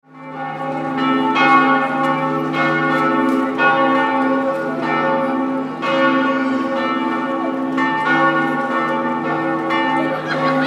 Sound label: church bells ringing in the city